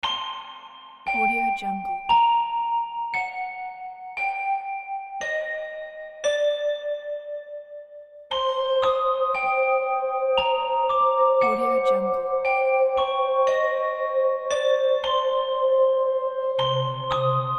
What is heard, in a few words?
haunted cradle